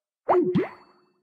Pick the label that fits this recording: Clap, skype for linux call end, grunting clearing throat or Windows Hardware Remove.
skype for linux call end